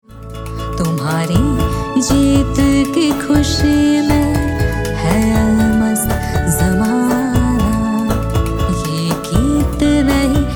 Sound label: CONGRATS FEMALE HINDI